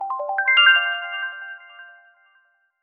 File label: ringtones; Anise; HTC One M8